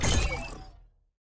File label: button map click; lobby; strawberry